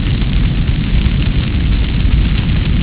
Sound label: fire; scorch2000